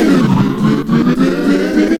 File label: scratch; Zero-G; Freak Beats